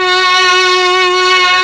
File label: STR, STRING; ILIO; Propeller Island Legendary M400